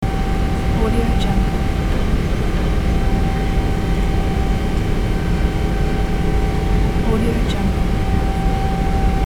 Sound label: airplane in flight engine ambience interior loop